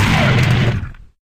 gun motor stop; dragon gun; tf

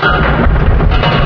freight move, plats; source engine; hl1

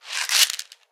pills deploy, pain pills; player; video games; source engine; left 4 dead 2

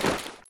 taunt yeti standee equipment jingle; video games; source engine; tf

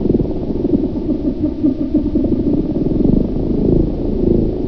alien purrmachine, ambience

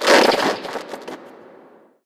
player exit jump paint

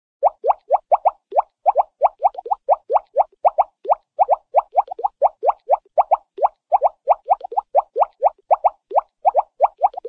water, drip blurp drips, fast; cartoon

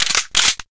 flaregun world reload; tf

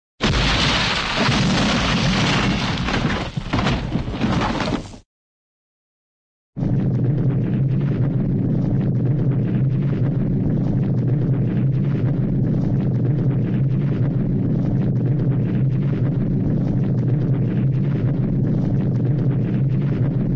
earthquake short earthquake rumble; cartoon

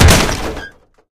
bumper car hit; tf

